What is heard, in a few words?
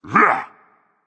grunt attack berserk; cuchulainn